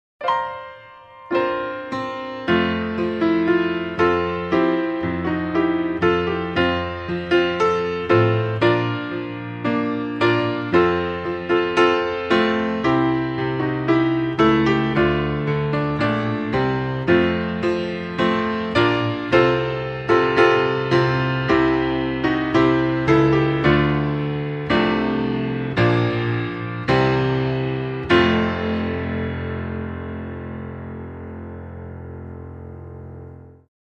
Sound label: Auld Lang Syne, Solo Piano